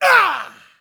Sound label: hurt heavy